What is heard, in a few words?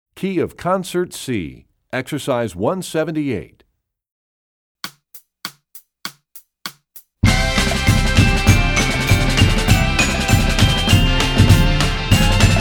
Percussion; Rubank Studies Concert C; EE2000 Book2